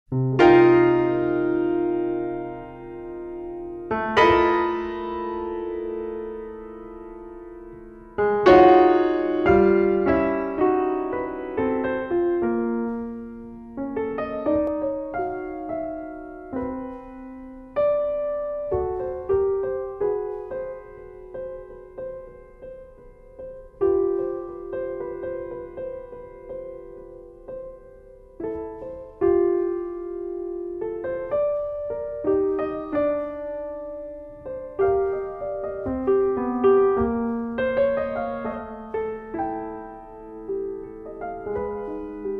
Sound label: Transition